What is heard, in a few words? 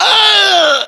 death, english